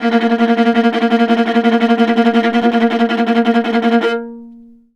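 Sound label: VIOLA tremolo A#2; Orchestral; DirectWave; Varazdin Orchestral; Viola II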